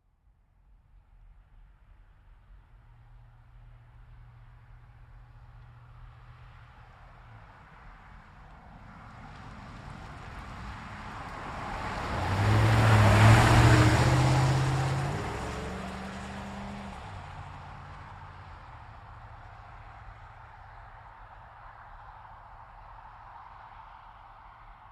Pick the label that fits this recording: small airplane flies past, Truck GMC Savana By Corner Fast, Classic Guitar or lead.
Truck GMC Savana By Corner Fast